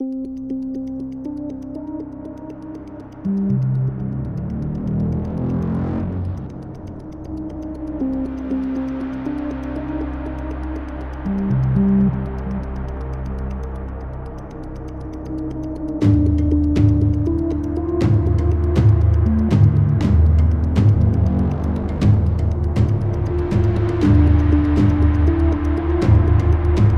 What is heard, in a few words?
Thriller Tension